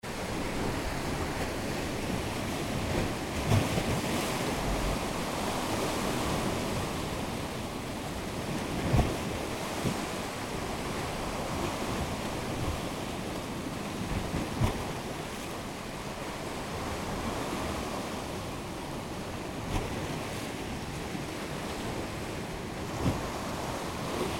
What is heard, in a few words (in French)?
Cap d'ail, debout; M140Neumann